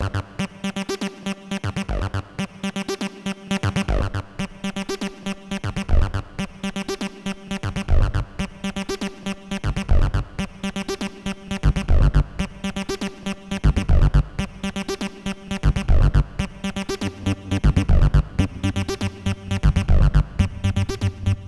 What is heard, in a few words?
acid sub; subcolours